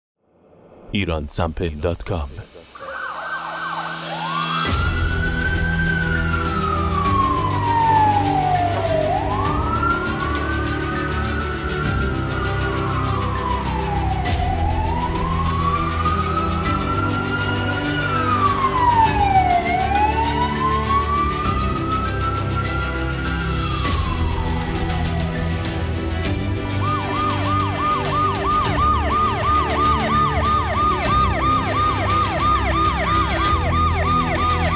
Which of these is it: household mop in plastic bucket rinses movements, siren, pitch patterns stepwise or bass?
siren